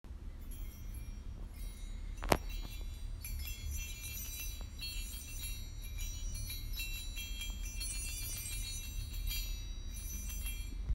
Pregnancy Chime

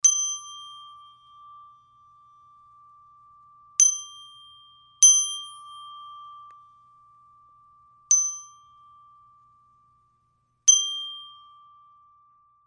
Zenergy Chime Solo; Woodstock

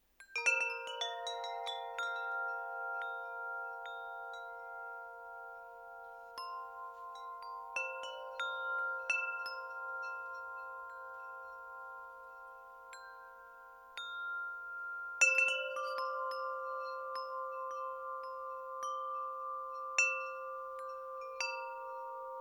Chimes of the Eclipse Silver; Encore

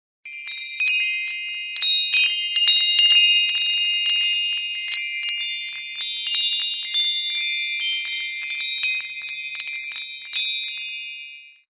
Gregorian Chimes Soprano

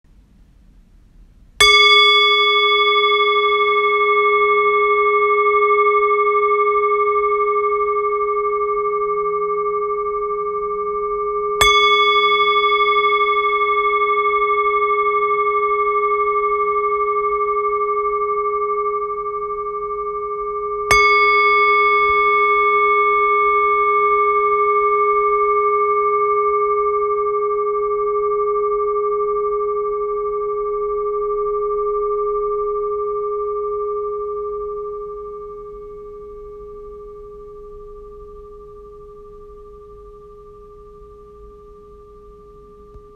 Bowl

